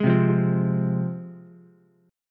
C Suspended 4th Sharp 5th C Ukulele; strummed; JGuitar

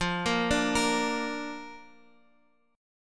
D Minor Sharp 5th F; arpeggiated

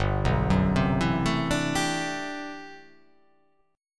G Major 13th; arpeggiated; JGuitar

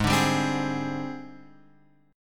G sharp Major 7th Flat 5th, Guitar, Open F; strummed; chord

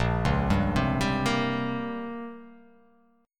A sharp Minor 6th Add 9th A sharp down, chord

